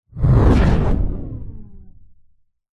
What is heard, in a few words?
sound design cinematic whoosh dark into a crack bolt impact; zapsplat